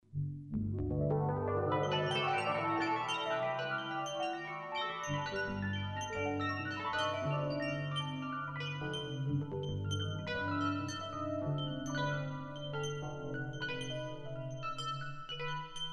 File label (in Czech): zvonky probuzeni 2 synt